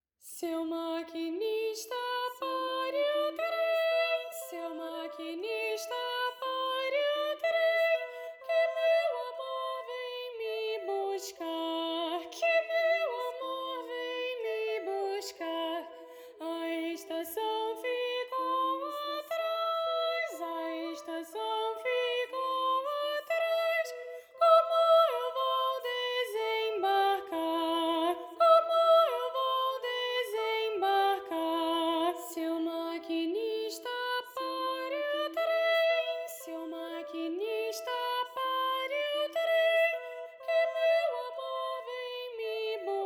Canon a duas vozes 1 VOZ GUIA; Canção 01 Canon a Duas Vozes – Arte de Toda Gente; O Pequeno Cancioneiro

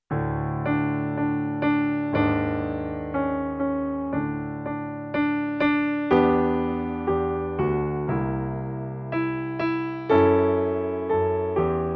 O elefante PIANO

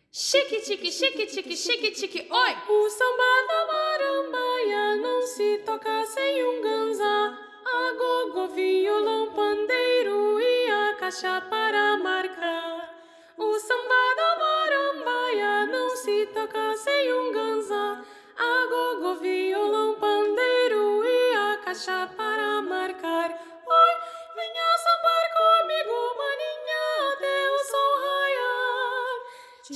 VOZ GUIA; O Pequeno Cancioneiro

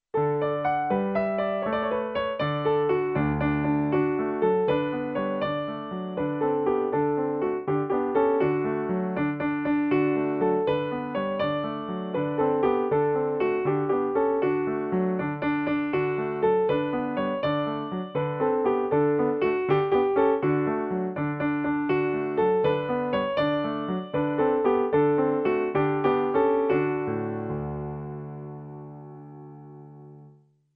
A canoa não virou PIANO

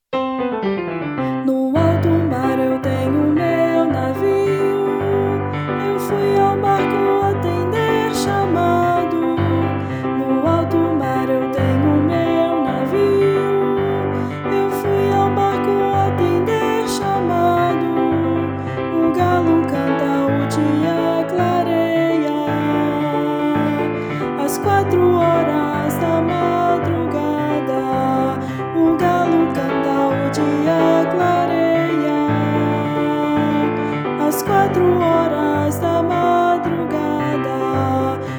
VOZ; 15 canções capixabas

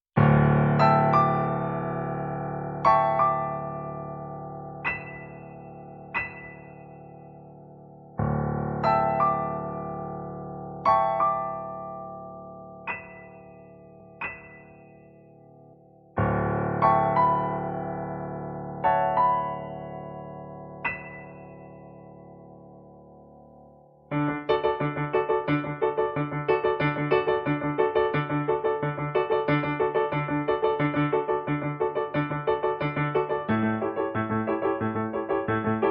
piano; O vapor da Bahia; 15 canções capixabas

